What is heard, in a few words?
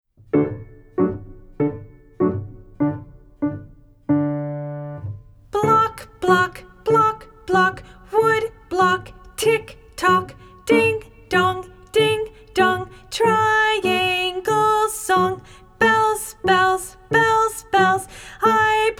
Instrument Fun